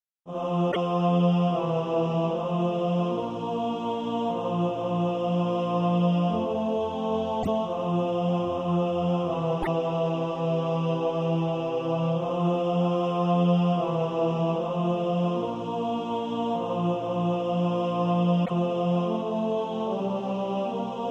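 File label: tenor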